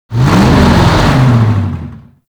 APC Move Start; Warframe; Lasrian

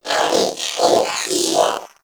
Companion Vocal Pant; Pets; Warframe; Zanuka

